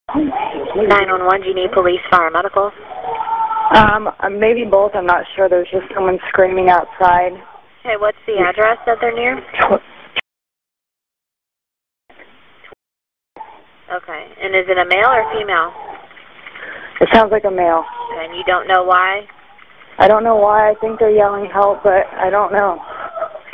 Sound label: 911 call SCREAM PORTION; Witness